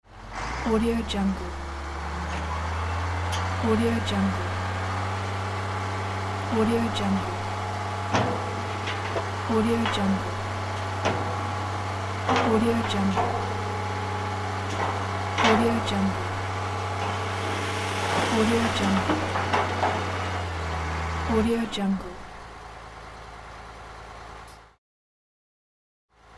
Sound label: truck unloading sounds